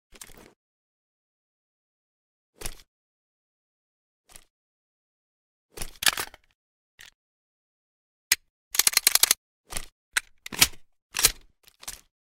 glock17 inspect empty; EMXArms; pulse

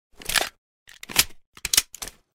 glock17 reload empty; EMXArms; pulse

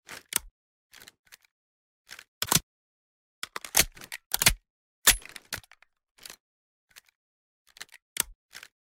deagle inspect; emx kund50

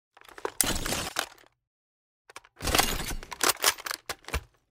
mg90 reload empty; emx

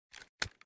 deagle draw; EMXArms; kund50

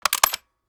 inspect boltpull; EMXArms; umx32

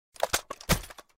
rpk draw; emx draftsman

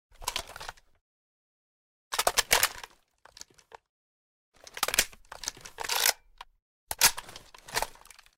rpk inspect; emx draftsman

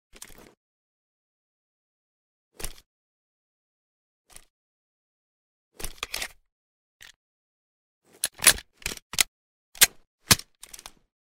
glock17 inspect; emx pulse

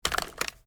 inspect rotate, arms; emx atlas

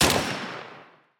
shoot, arms; emx pulse

